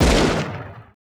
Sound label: GSHOT, LA GUNSHO; Total Control - Action Cutz